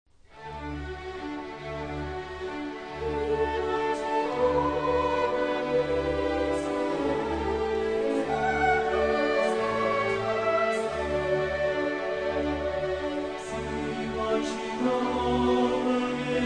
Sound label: Choir And Orchestra